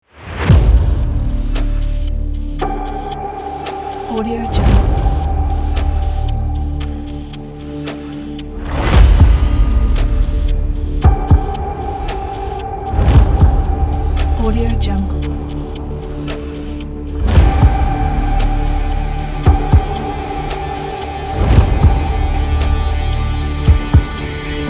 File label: action trailer; edrecords